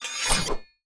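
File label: infantry sword, weapon; Battle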